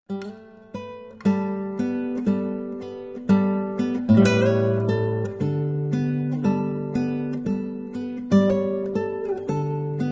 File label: Interlude guitar